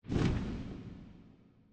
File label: flap, phantom; mob; minecraft